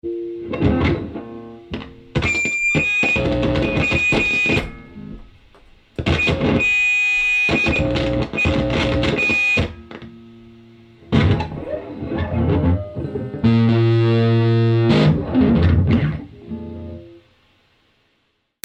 Static Guitar Plug In-Out Feedback; Static , Guitar Plug , In - Out , Interference , Buzzes , Feedback; Guitars; Musical

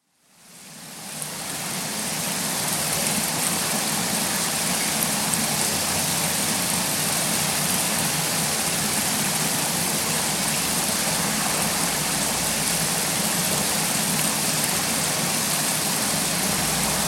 Fish Farm, breeding room; Water; BBC

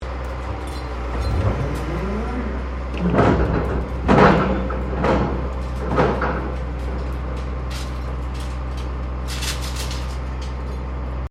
Construction Drill Maneouvering

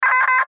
Radio Morse Code; Morse Code Weak Signal Letter W; Morse Code Sound Effects; Blastwave; Warfare

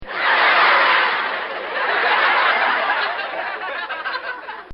Laughter; Human; recorded in a custom built sound booth

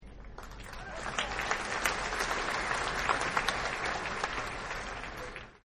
Applause Light; Applause Erupt; Human

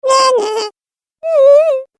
Cartoon Game Voice Rude Sounds; Cartoon Voice Sound Effects

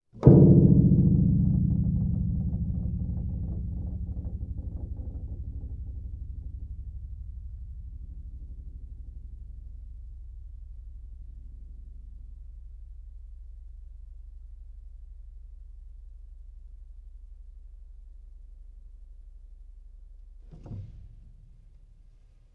Piano Note Low Dong; Pianos; Musical; Tom Hutchings

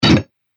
Robotic Menu Click; Robot Sound Effects

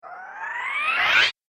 Cartoon Slide Effect; Cartoon Sound Design Sound Effects

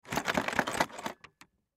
Box Shake Slow, Box Shake Slow Package; foley; Shaking Sound Effects; Objects; Blastwave

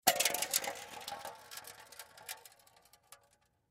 Beer Can Roll Full, Beer Can Roll Full Closed; Rolling; Foley

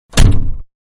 Door Close Interior, Van; Cars; Vehicles; Manhattan Production Music

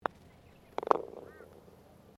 GOLF PUTT HOLE; Various Golf sounds; Sports

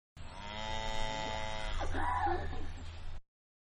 Cows Two Mooing; Animals; Cow-Two Moos; Manhattan Production Music

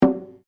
DRUM TAIKO SHIMEA MF, Small Japanese Taiko drum; Miscellaneous Musical

